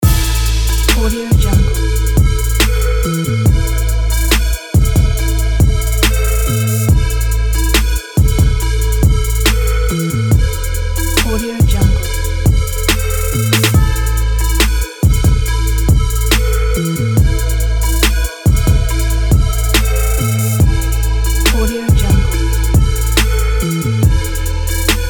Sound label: trap loop